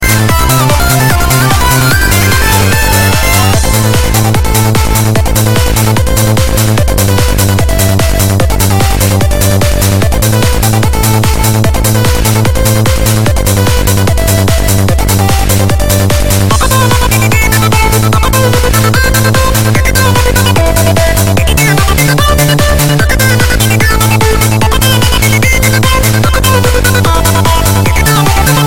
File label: Techno